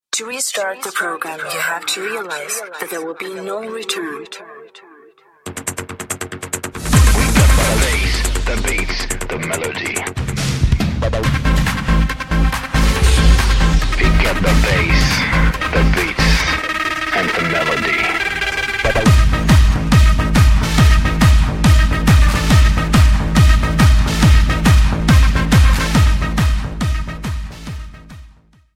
bass beats melody

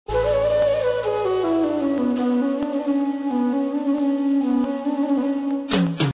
bhansuri msg tone; ringtones; sms

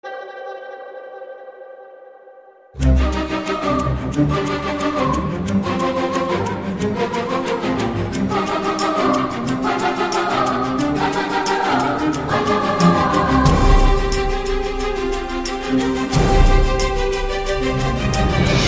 passionate guitar

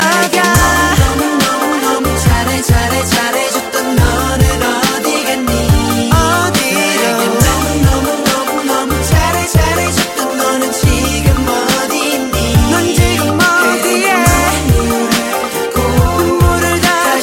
Wiping walls

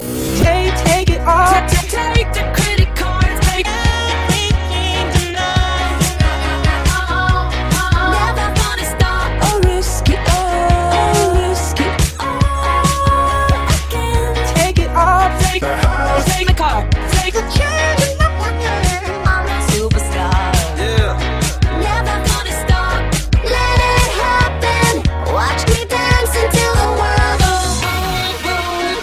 pop

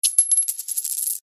coin

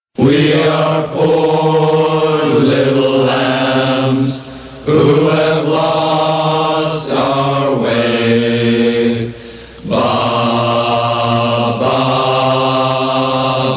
baa baa black sheep

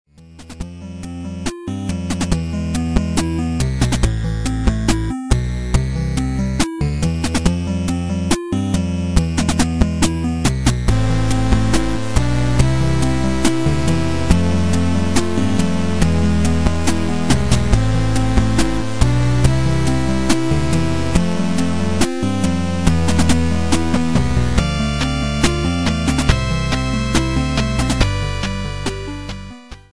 8 bit

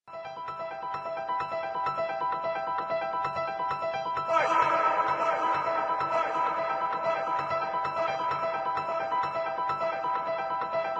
Tone

